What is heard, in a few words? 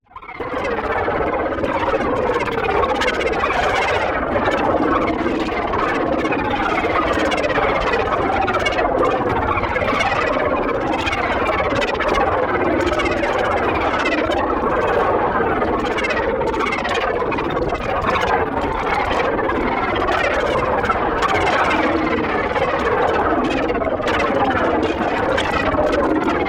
PARTICLES SMALL SLOW Frantic Noise; boom library; Neon